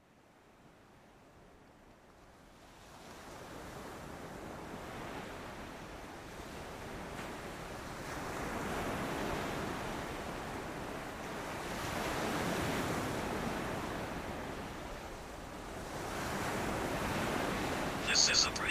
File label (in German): Musik mit Meeresrauschen; 30 Minuten